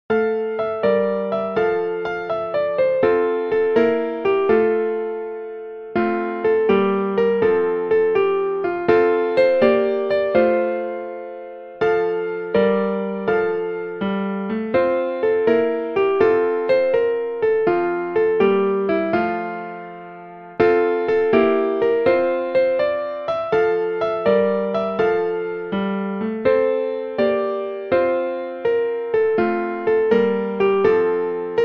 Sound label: sumer is icumen in piano; "Sumer Is Icumen In" is an old English Folk Song which dates from the middle of the 13th C